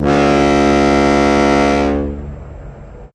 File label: Boat Horn, Full